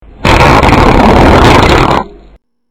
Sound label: fart